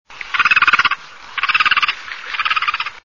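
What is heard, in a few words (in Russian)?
caplja belaja bolshaja, great white egret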